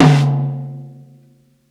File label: 60s TOM MED, DRUMS ONE SHOTS; BASEMENT DRUMS VOL 2